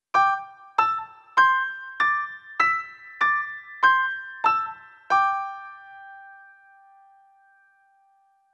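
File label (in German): CHINESISCHE QUINTEN g-d trumpet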